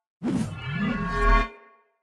Great Sword Accent Heavy; Weapons; Swords; Dendrit Ara Great Sword; Accents

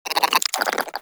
Cryptilex Vocal Idle; Characters; Warframe; Hunting Critters

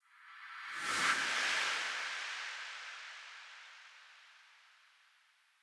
Area Discovered; Hud UI

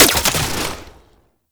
Archgun Mech; Warframe; Weapons; Archwing; Tn Nokko Archgun

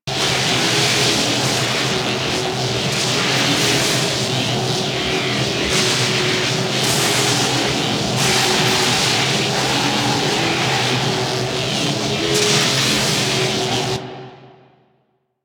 Corpus Powerplant Central Column Electricity Loop; Ambience; Warframe; Corpus Railjack; Tether Power Plant

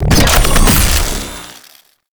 Mech Shocking Iron Start; Warframe; Nechro Mech; Abilities

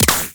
Shield Impact

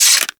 Generic Bow Load Arrow; Warframe; Foley

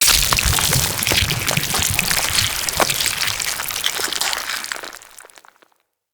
infested bone blade death gore; Enemies

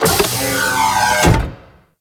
Ship Destructable Socket Open; Gameplay; Ambience; Warframe; Corpus Ship Remaster

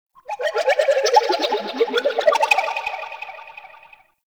Ocarina; Warframe; Ambience; Nokko Colony

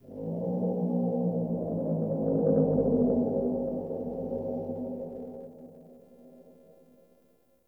Interior Texture Large Moan; Ambience; Misc Indoor; Warframe; Infested Eris

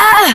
Generic Short Pain Operator Voice

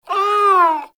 Kitten Meow Agitated; Enemies; Warframe; Catbrow

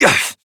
Pain, Adult Operator; New War

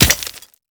Ghoul Expired Grenade Hit Dirt; Warframe; Grineer Ghouls

